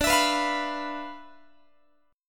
D Minor 6th Add 9th D, Guitar; Dm6add9 strummed

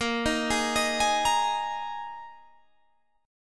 Eb Suspended 4th

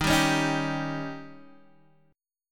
C sharp Suspended 2nd Flat 5th D sharp, chord

